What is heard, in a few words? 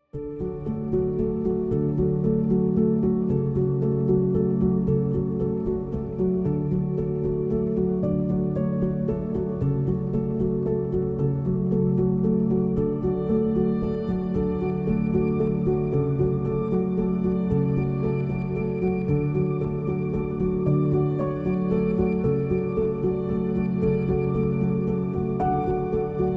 bgm